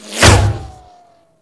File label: metal imp, ambience; Quake 4 Alpha; id software